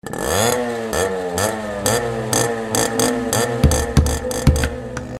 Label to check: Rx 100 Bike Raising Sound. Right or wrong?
Right